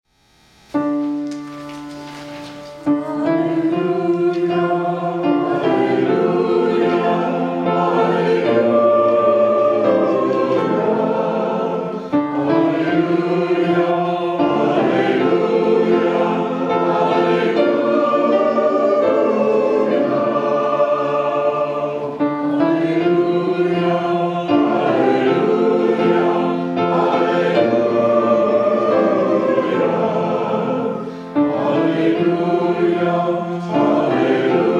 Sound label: hymn; Alleluia